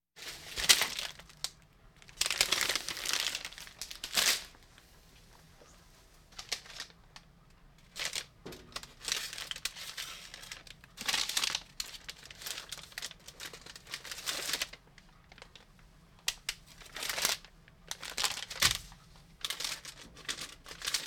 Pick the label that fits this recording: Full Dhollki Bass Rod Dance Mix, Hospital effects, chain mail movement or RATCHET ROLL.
Hospital effects